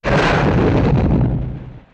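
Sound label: indoor explosion, smoke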